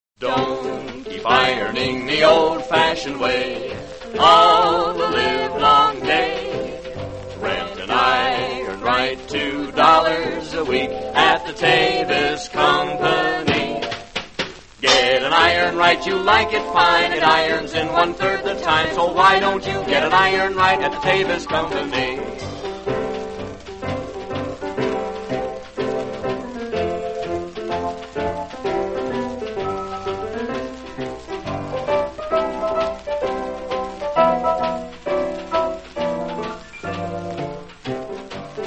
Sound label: radio jingles; tavis ironing; allied radio artists